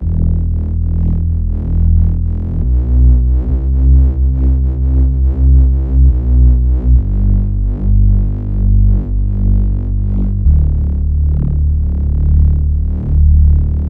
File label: Bpm138 Bass Loop C G D; Light; Prime Loops; Dubstep Illusions